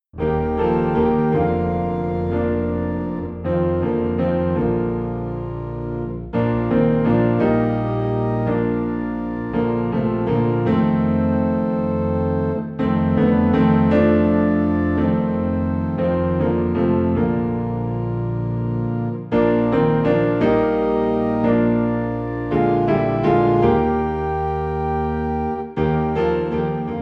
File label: Piano and Organ Low in B Flat; Have Thine Own Way Lord